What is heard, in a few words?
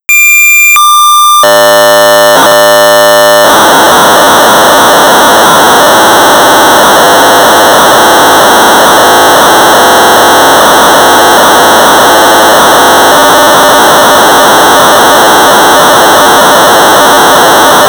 BASIC Sorcerer 1200baud; Z80 Disassembler